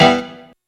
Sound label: RAVE